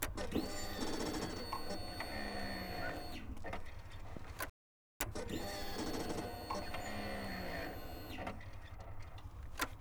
Suzuki GSX foley ignition; Suzuki GSX-R1000; MKH8060; Pole Position

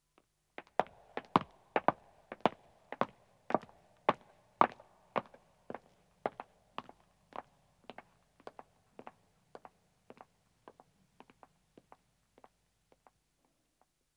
Stone Walking, 1 Man 1 Woman, footsteps; BBC Sound Effects Library; Footsteps II

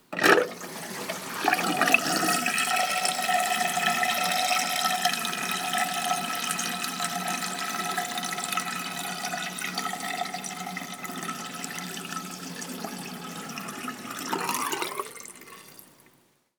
draining sink; Bathroom; Kevin Durr; Essential Bathroom Sounds

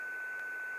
Tone With Minor Static, Shortwave

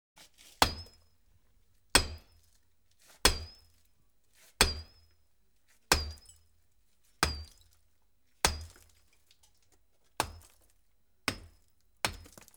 Destruction, Brick Wall; BBC Sound Effects Library

